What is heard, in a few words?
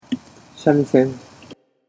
shamisen; Japanese